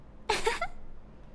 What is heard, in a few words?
"Heh", human girl villager; customnpcs